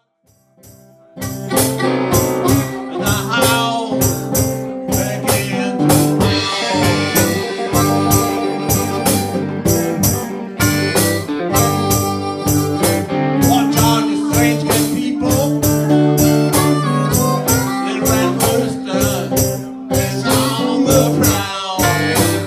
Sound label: cajon